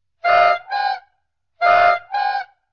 horror; sprunki oc patrice

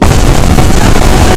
cheap scream

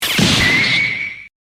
rubber

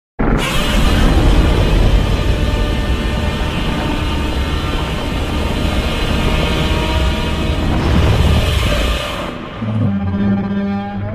epic roar; godzilla

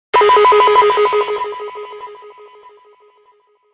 dub siren sample

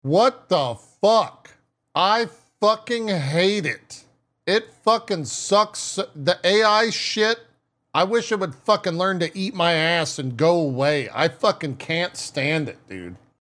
eating aah

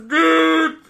button

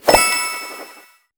sparklee

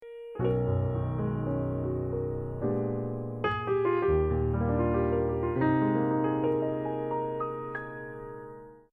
jazz; le service culture

